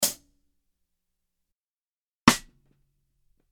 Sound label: Dry Drms Hats Xstick